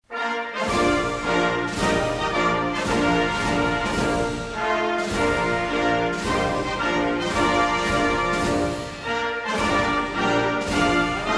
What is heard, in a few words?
anthem